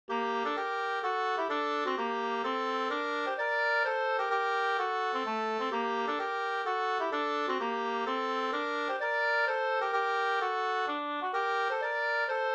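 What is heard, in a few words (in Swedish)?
polska, tvåstämmig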